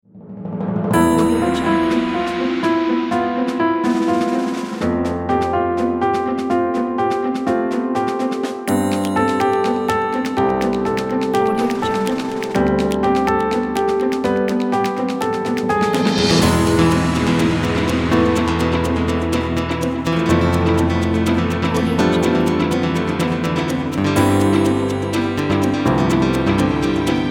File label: cinematic flamenco trailer